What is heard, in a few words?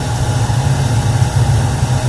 cavern rain; nature; hl2